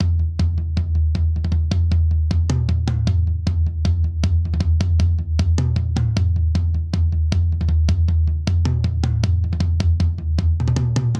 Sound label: Straight Reggae Toms Only 78 bpm; 4 4; MIDI Clips; Ableton; Factory Packs; Drum Booth